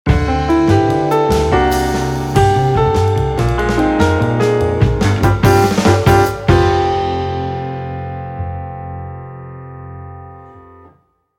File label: Jingle Start; Gran Turismo HD Concept